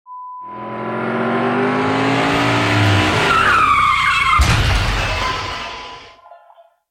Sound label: Car Crash